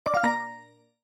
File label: verify step complete